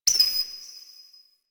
Beach Night Bird; Ambience; Warframe; Grineer Beach

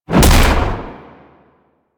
Queens Chamber Shield Blast; Ambience; Warframe; The War Within

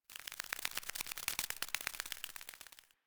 Fairy Idle Single Butterfly; DE; Warframe

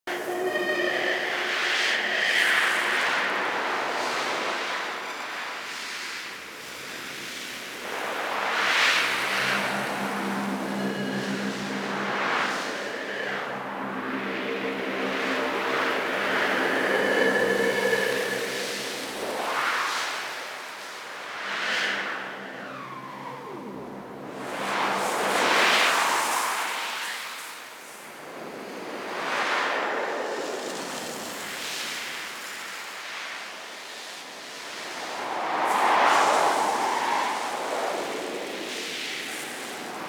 Landscape Wind High Loop; Warframe; Ambience; Venus Open World

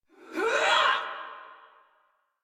Attack Effort; Warframe; Dax; Ranged

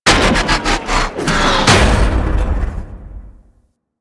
Research Facil Power Generator Shutdown; Venus; Objective Rooms; Props One Offs

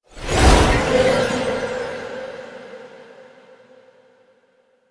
Horse Disappear; Foley Actions; Warframe; Vehicles; Duviri

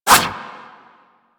roulette projectile jam; Warframe; Cowgirl

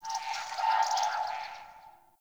Day Bird; Ambience; One Offs; Glades Of Andurin

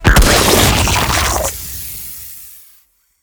Infested Drop Pod Destroy; Warframe

